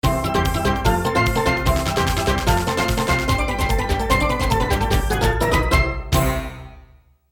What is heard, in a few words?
Round Clear; Monster Lair